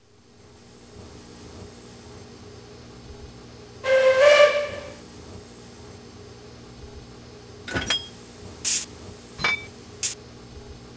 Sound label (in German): Loksound; Roco; Loksound H0